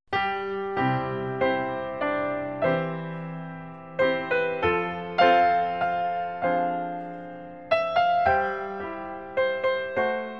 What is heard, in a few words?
Home On The Range Instrumental